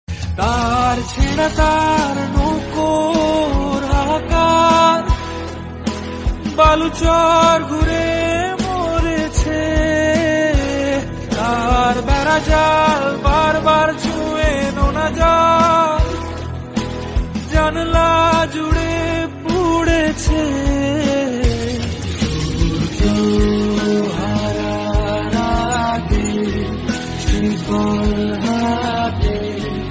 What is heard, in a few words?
Bengali Ringtone